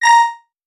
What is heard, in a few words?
Coins To Continue